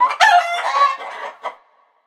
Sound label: ambient farmyard chicken